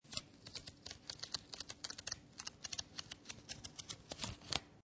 Book Flicking Through Pages, Book pages flicked by thumb.; Classroom; Schools; Lynne Music